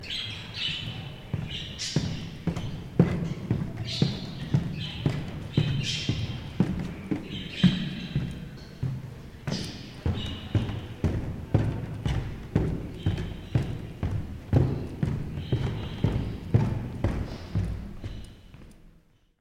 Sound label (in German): Atmo Treppenhaus